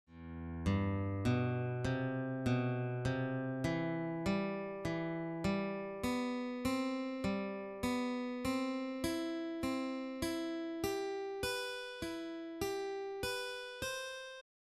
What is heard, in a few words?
arpeggios practice, guitar